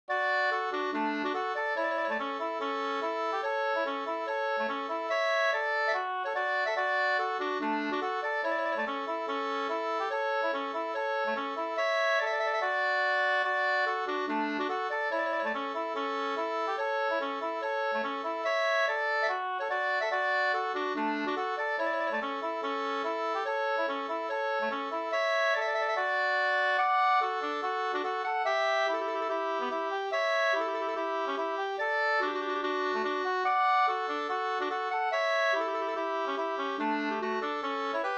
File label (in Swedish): schottis 4-4; Ramsele